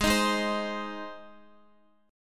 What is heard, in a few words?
Ab Major Ab down Guitar; strummed; JGuitar